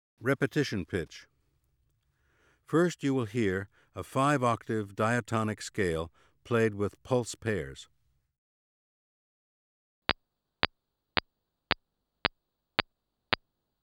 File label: Scales with repetition pitch